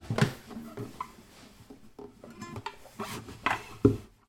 musical person pick up acoustic guitar getting ready to play